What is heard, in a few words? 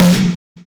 Snare, Drum and Bass